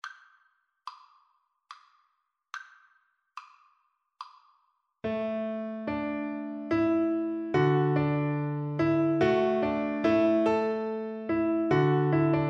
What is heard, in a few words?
water music, Viola